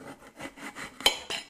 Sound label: cut pumpkin